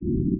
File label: Noise LF 6k; Sound Illustrations